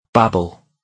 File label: babble